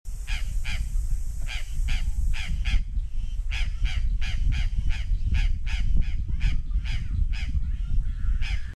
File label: Cyanocorax chrysomelas